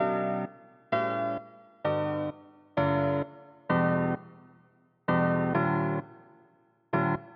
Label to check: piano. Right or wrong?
Right